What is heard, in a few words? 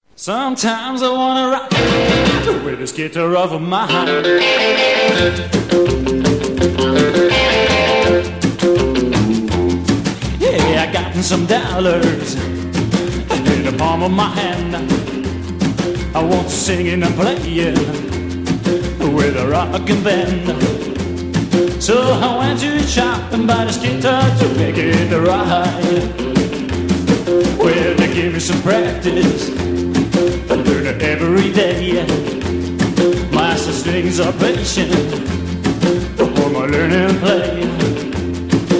six strings